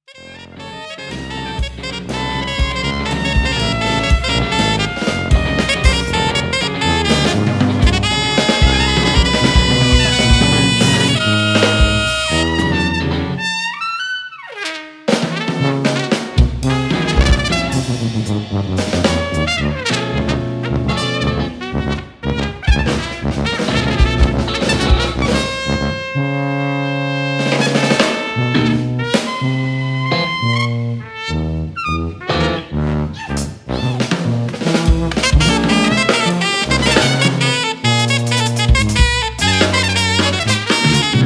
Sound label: fanfare